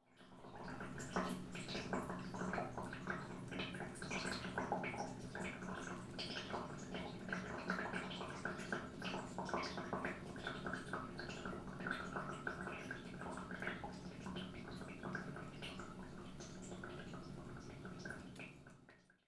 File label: Sink Drain Gurgling